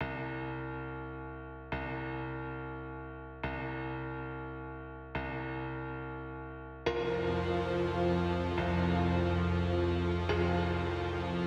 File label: theme; horror game; start screen